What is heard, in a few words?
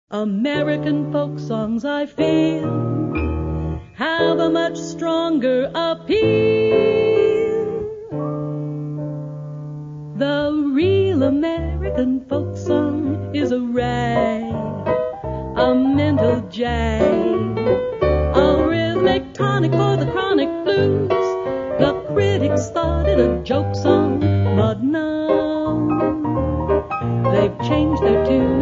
folksong